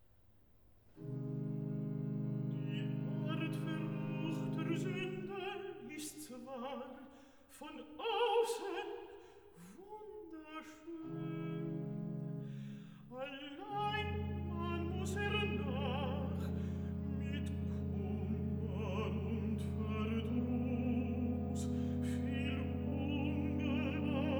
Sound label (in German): Recitativo (Alt), VOCAL; alto; BWV054; Die Art verruchter Sunden; BACH